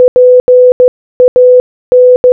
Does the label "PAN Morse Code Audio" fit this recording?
Yes